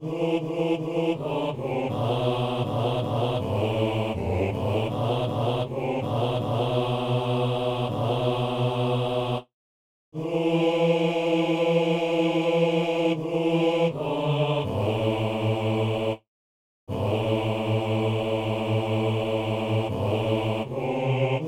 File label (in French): psaume hommes; Psaume 97 (98)